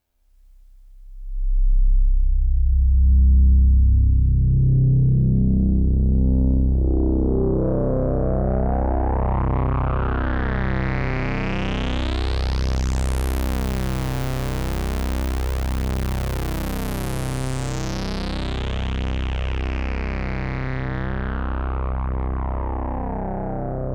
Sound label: Filter Sweep Memorymoog